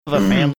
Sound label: clearing his throat